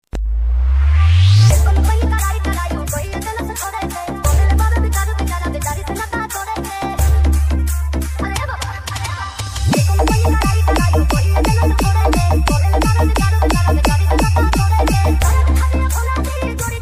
Bolbam Song Hard Bass Mix; gonda

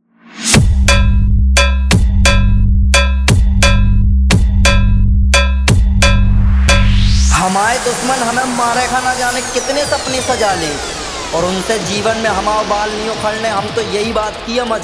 Music Hard Vibration Beat Dance Mixx

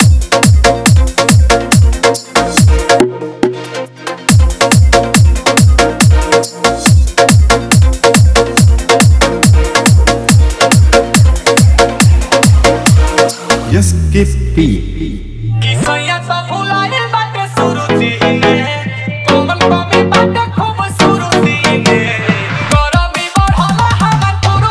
Bhojpuri Dj Remix Song Hard Electro

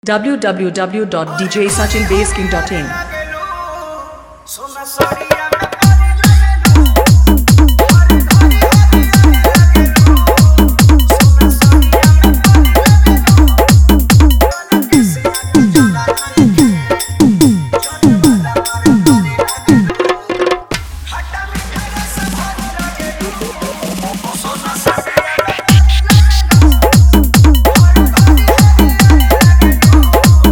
Viral Trending Bhojpuri Dj Remix Song Hard; Vibration Mix

